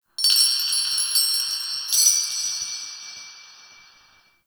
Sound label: Metal Chimes; Warframe; Ambience; Nokko Colony